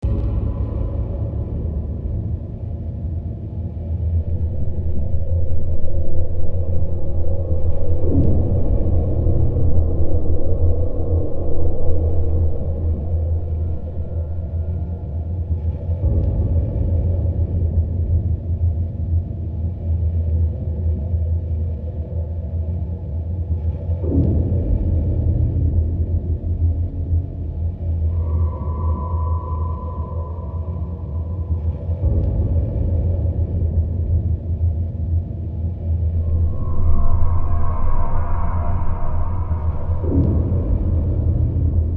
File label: ATMOS dark; 39steps